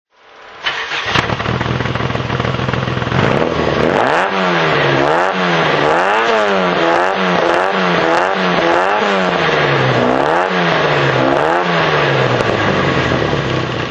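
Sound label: austin healey sprite tuned throttle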